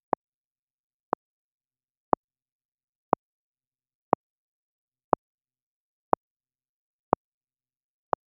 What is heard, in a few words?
halloween